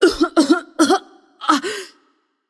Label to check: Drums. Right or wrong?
Wrong